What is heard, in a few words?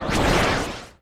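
Enemy Attack Normal; disney star smash android ios mobile gamerip 2020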